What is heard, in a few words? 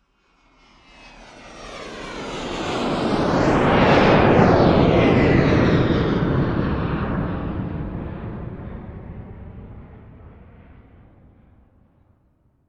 transportation aircraft military jet pass external, Fighter Jet Fly By; Vehicles; Airplanes; McKinneySound